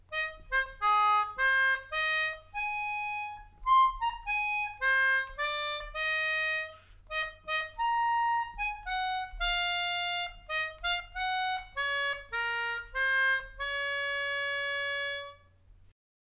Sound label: USA National Anthem, Melodica